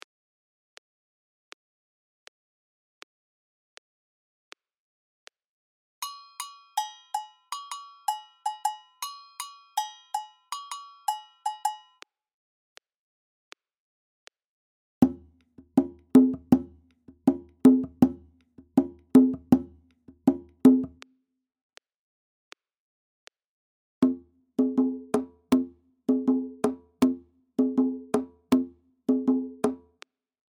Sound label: Ijexa 80bpm; Lateinamerika